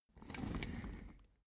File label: office chair trundle slow short